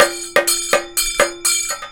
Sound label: KATAK, Indian Traditions